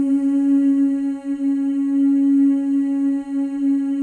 FEM, Symphony of Voices; Spectrasonics

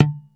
D1 DEAD, STIK BASS; D-STIK BASS1

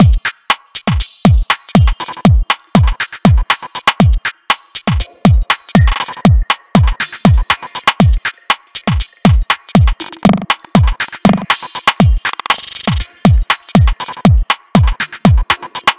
Machine Mono Beat, Drum Loop; Sonic Foundry; The Electronic Music Manuscript Collection; Drum Loop Structures

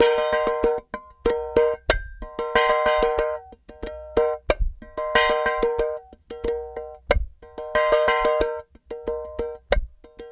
clay pot, world percussion

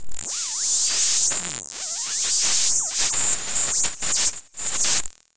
loop, techno atmos

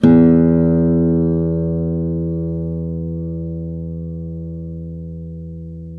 ACC FG E, Acoustic Guitar; East West; Steve Stevens Guitar Samples Collection

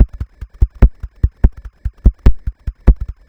PUTT PUTT

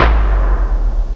SYM TOM; DRUMS; M8; CMI Factory

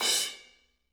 crash, crashes

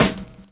DRUM CHOP; AMG Gota Yashiki Groove Activator

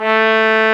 BRS BONE, Trombone; Roland L-CDX-03 Disk 2

